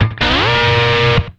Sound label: SLIDE KICK, guitar; Spectrasonics; Bizarre Guitar